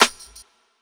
Clap, Drums; One Shots; Bryant; Ableton Live 12 Lite; Core Library

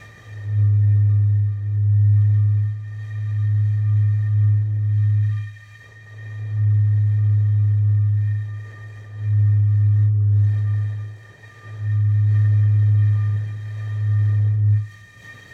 Whistle Stone Wine G sharp Loop; Journey

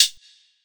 Hihat Closed Reverb

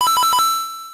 coins; Super Smash Land

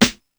Snare 12 Bit

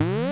subscreen close; Open Zelda; Quest Designer 2.0.4b

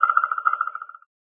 avatar message sent; uisounds; There; ThereClient

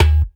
Tumba Low, One Shots, Drums, Misc Percussion

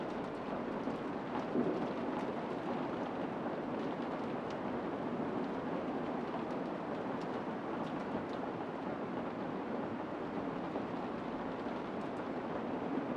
rain medium inside; background

